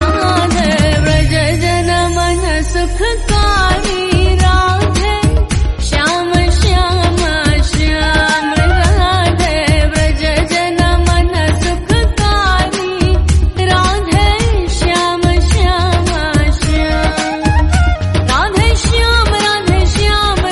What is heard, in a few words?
Hindi Bhajan Song Ringtone